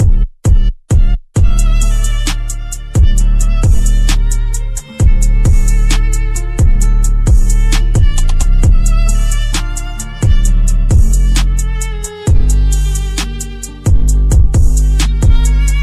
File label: Trap Remix Bass Boosted; The Godfather